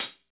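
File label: cymbal, Roland; Club-50 - Foundations Roland; Ext.Snare 1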